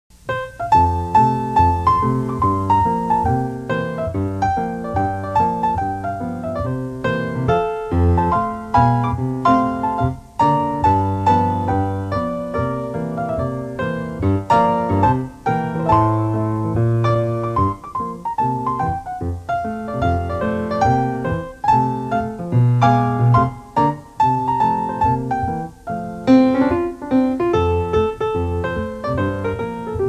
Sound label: jazz piano